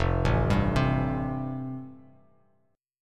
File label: F Major Add 9th, Bass Standard; Fadd9 arpeggiated; JGuitar